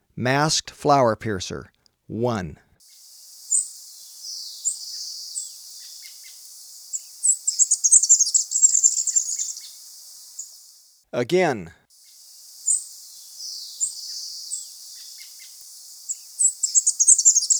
Masked Flowerpiercer, Birds of Ecuador; S. America

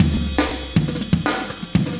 chique 120, loops, 120bpm; Sound Effect Library

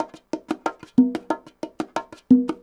bongos 120; latin; 120bpm

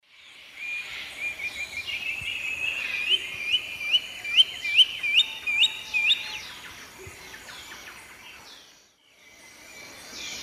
Accipiter poliogaster, call; S. America; Eastern Lowlands

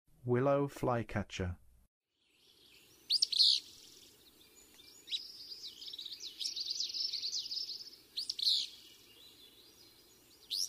Willow Flycatcher; North America

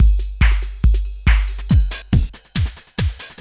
techno 140

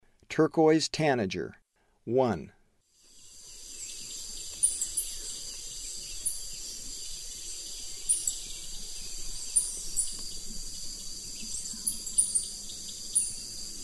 Turquoise Tanager; S. America; Eastern Lowlands

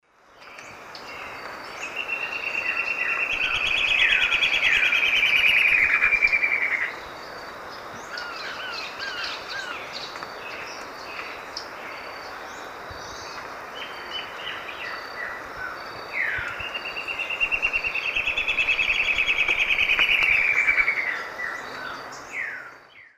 Myrmoborus leucophrys, duet; S. America; Eastern Lowlands

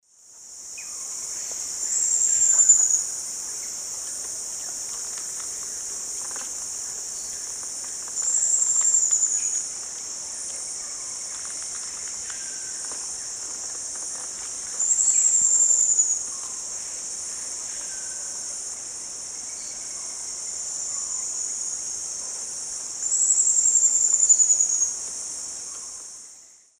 Epinecrophylla fjeldsaai, song; S. America; Eastern Lowlands

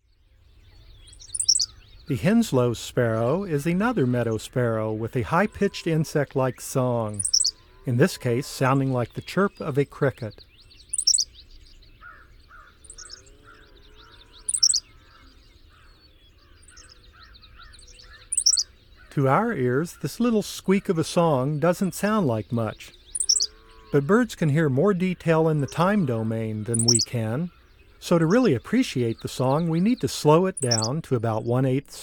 Henslows Sparrow; North America; Common Western Species; Know Your Bird Sounds

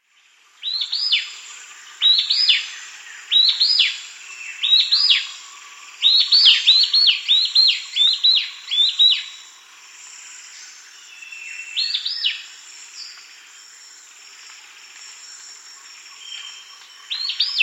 Dot-Backed Antbird; S. America

